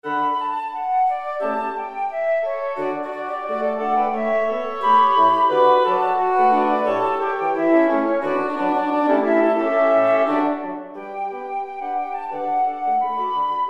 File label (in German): blaeserquintett